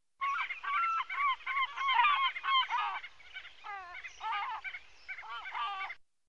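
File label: Daurian jackdaw voice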